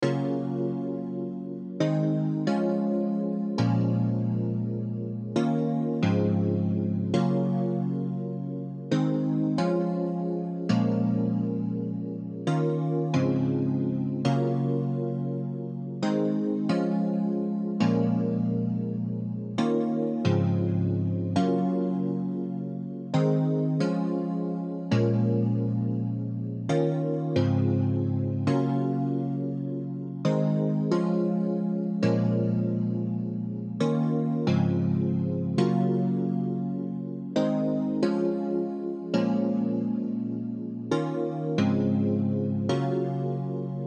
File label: wet rhodes